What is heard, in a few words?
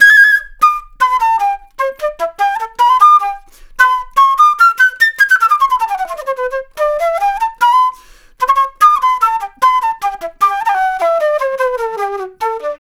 Flute Solo, Jazz; Sonic Foundry (Sony Creative Software)